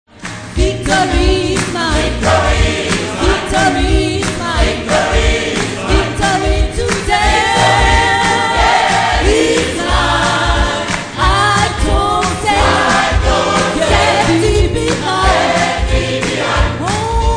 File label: Gospel